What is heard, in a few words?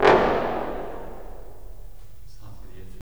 BRS, Roland LA Composer